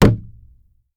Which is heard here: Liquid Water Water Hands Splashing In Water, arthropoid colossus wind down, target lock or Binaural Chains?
target lock